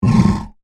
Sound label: Monster Grunt, Horror, Monster, Grunt, Creature; Monsters